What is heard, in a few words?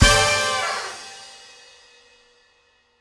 Disco Stinger Stab; Plants vs. Zombies Battle for Neighborville